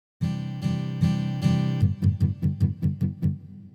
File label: Fill Ramping slow